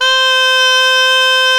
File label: C5, gralla; SoundBytes - Early Patches